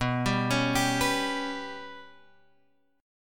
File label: B Suspended 2nd Sharp 5th down Guitar Drop D